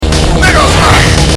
smash; aof2

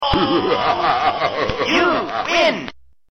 ha ha ha

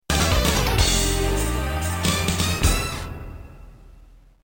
music victory

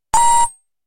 Laser S; Prototype

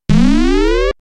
warning

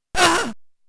grunt; Possibly an early death scream for an enemy, until it got replaced with something else.; prototype of the Japanese version

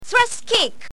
cammy thrust kick

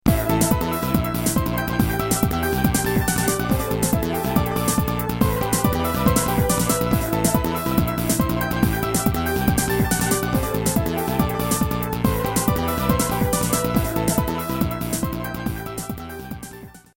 music victory; psylocke

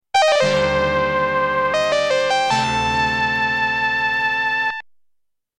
Game Over; Shinobi III

